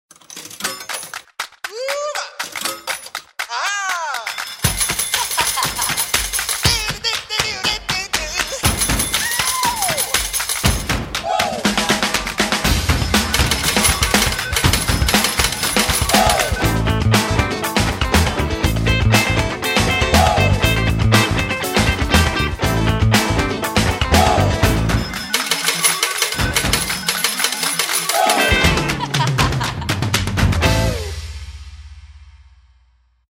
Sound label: banda; MaronnasSlots